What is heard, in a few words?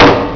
gavel